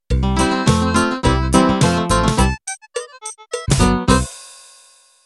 Country 2 Step End

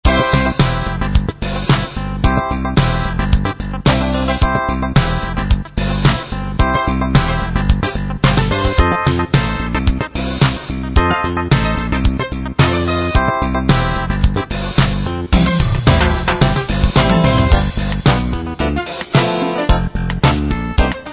80s funk

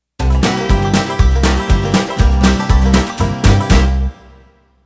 BlueGrass End

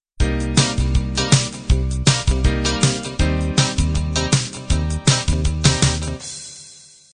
60s pop intro

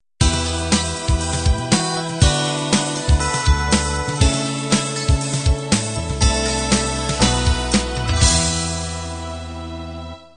6 string beat end

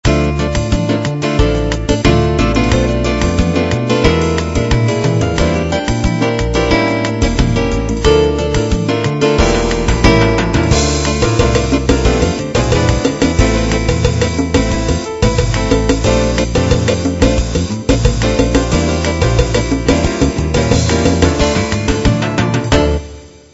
bossa

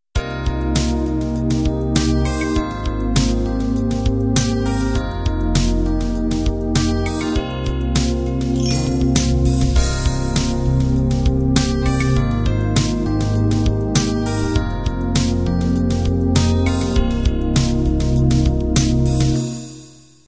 groove ballad intro

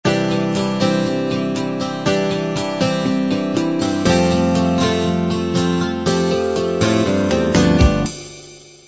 light rock intro

